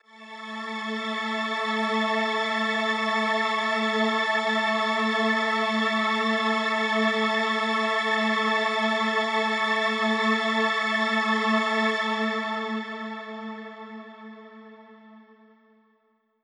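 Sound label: Mach String A major, synth orchestra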